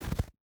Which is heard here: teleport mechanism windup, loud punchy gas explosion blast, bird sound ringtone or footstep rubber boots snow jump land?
footstep rubber boots snow jump land